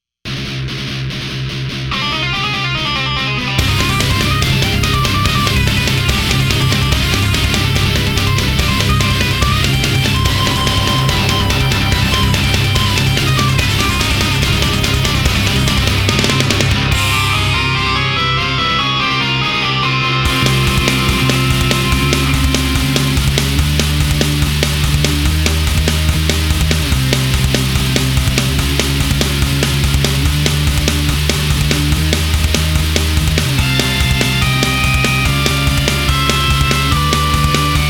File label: guitar chase; Extreme Guitar Chase; Cinematic Compositions